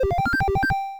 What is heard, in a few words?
MOVE; C64 Dreams; Multi-Sound Default